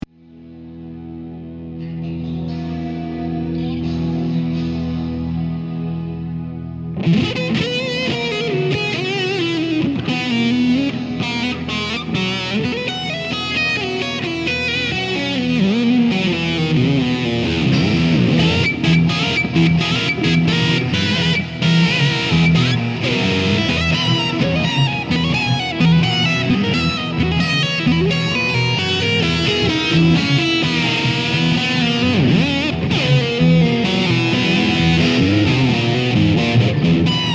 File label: lydian blues